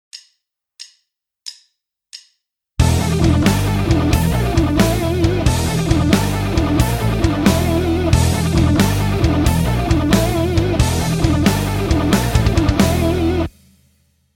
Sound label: guitar